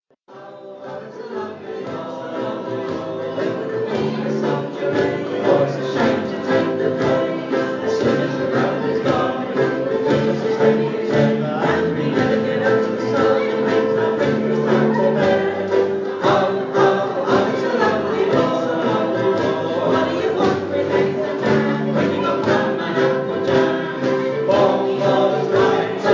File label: ukes